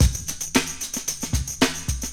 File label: RHYTHM